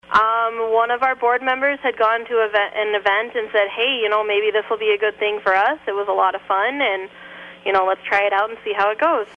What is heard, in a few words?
Dueling Pianos